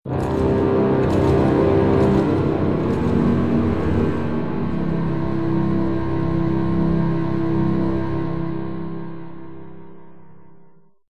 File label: Fail; Sniper Elite Berlin 1945